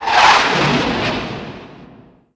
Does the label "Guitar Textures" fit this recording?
No